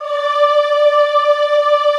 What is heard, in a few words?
EHH D4, Classical Choir; Best Service